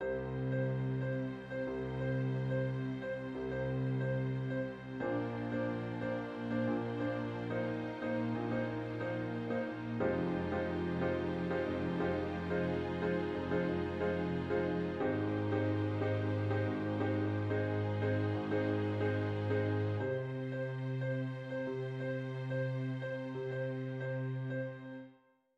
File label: Gong gong